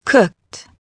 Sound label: COOKED